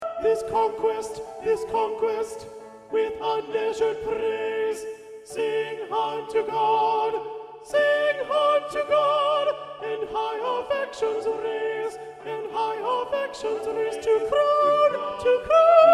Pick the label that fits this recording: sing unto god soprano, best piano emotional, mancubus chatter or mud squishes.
sing unto god soprano